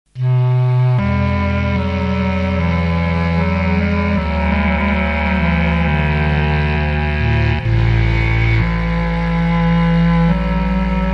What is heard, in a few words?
bass cla